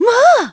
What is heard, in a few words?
Surprised; Mrs. Marie surprised; Zelda